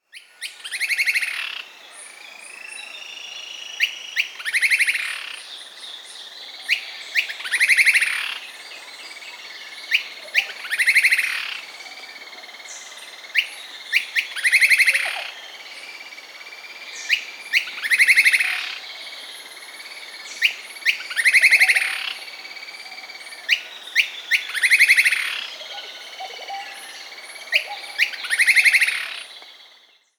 Brown-rumped Foliage-gleaner (Automolus melanopezus), Peru; S. America